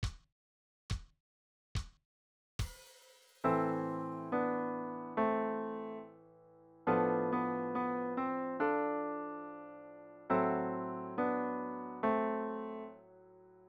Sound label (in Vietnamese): Rung DO SI LA